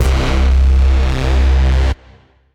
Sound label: grey panel reso bass; Ableton; Factory Packs; Chop and Swing